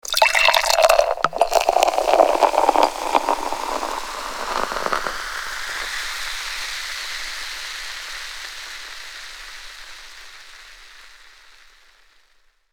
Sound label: Pour beer, Bar; el colmillo de cobre